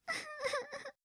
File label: breath